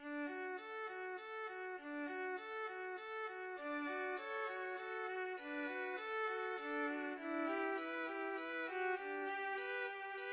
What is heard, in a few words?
saint saens swan, violin duet